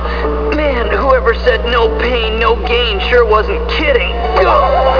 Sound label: pain